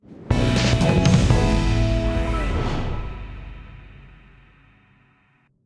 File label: Completed; Rocksmith